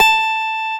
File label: DIDI, SYNTHESIZER, DIGI WAVE